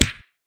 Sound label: punch; Crash-Course